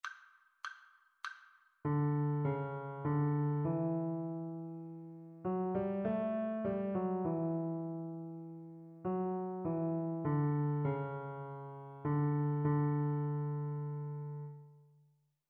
rhythmic dictation

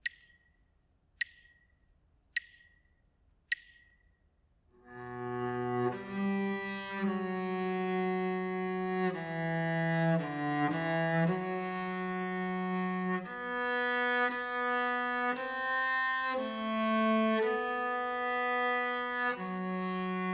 melodic dictation; eartraining

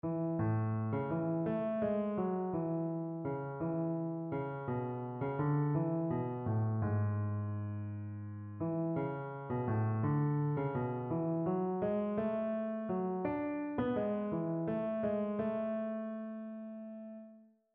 melodic error detection

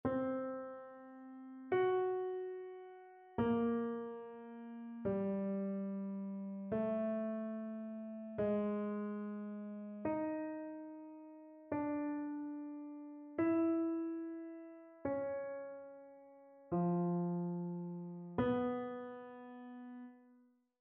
row dictation; ear training

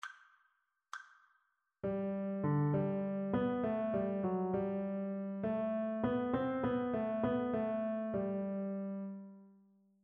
rhythmic dictation

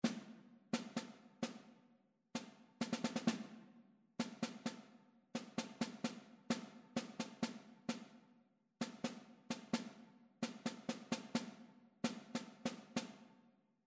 rhythmic error detection

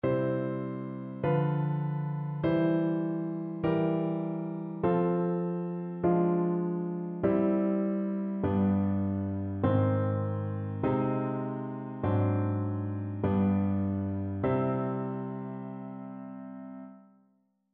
harmonic error detection

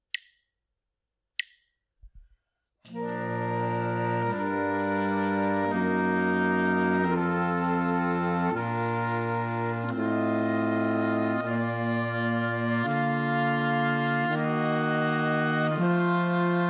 harmonic dictation; ear training

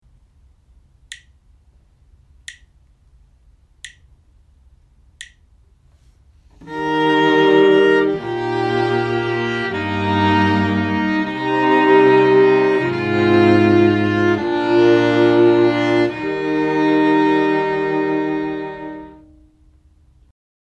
harmonic dictation

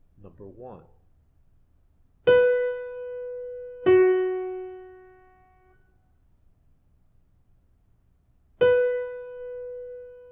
intervals

